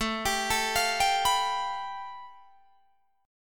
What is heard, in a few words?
A 7th Suspended 2nd Sharp 5th A, Guitar Drop D; chord